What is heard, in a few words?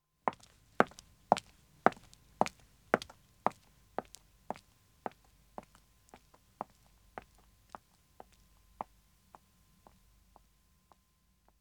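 Pavement Walking 1 woman departs; Footsteps; BBC Sound Effects Library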